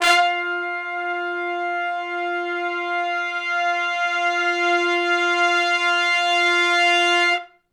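2 measure Long Note with Swell (F) unison, horn section, R&B; Sonic Foundry (Sony Creative Software); Sections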